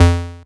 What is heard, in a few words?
digital pipes, Synths; Notes